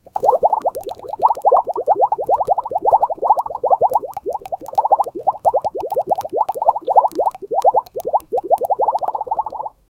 magical and cheerful soap, bubble

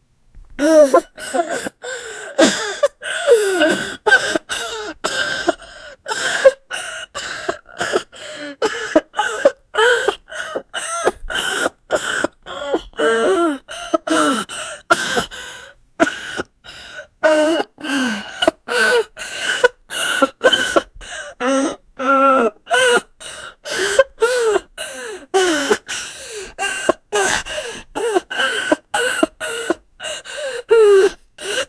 patient groaning in pain; suffering

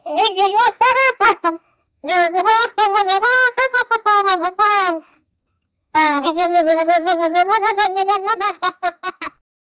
donkey, farm

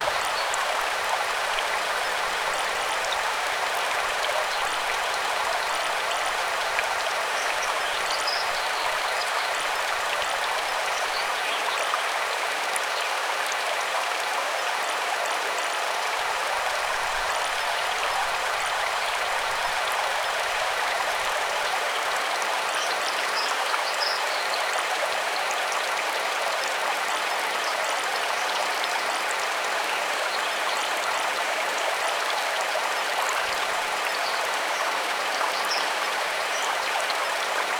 a small stream flowing le, leaves rustling

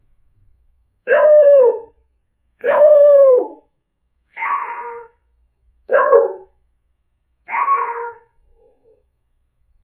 humanize lion catdogg lau, lion

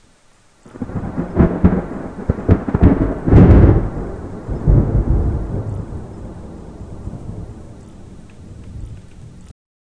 thunderstorm deep rumblin

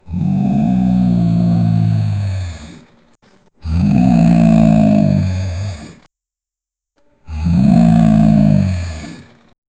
tiger growling

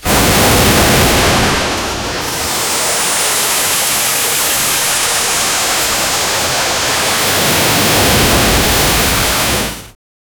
chocolate cascade, flowing

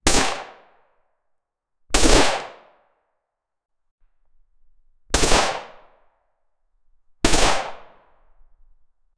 mcx spear 277 fury single

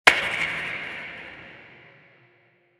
environmental hits

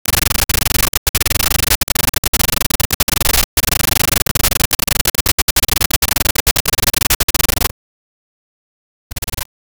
glitch effect, interference

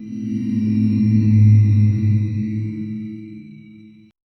soft magical ambient; mist

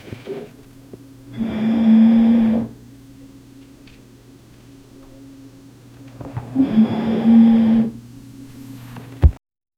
low hum shelf groan drawe; flicker

